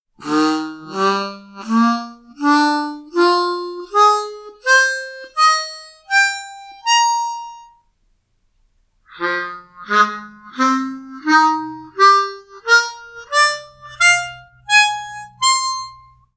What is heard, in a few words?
pulmonica notes